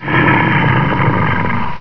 die, grizzly; wanted

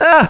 pain; wanted

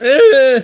scream; wanted

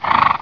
horse; wanted

